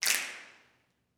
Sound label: SNAPS; Vocal Planet Vol1 Gospel; CLAPS+SNAP